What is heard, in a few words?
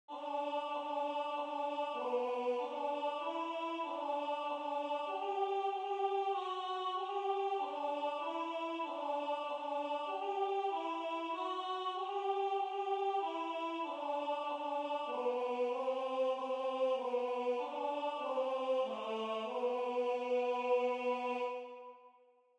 Alto